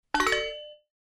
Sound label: bonus prizer small; Highland Reels